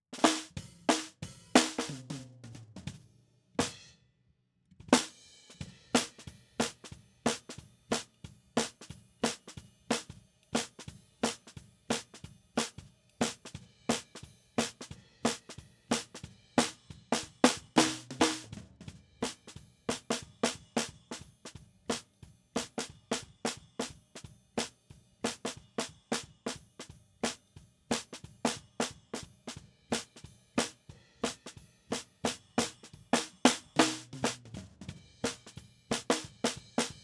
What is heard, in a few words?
SNR BTM